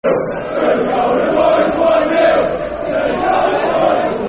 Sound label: Football Chant (Soccer Song); 1 Nil To The Golden Boys; Watford Wfc; fanchants